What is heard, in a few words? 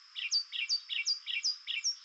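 PSITTACULA, Darwin's Finches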